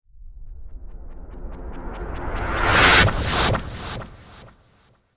Flashback Whoosh, Scary flashback whoosh transition; Wipes (Sound Effects:Sound Design)